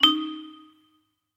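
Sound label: quiz sounds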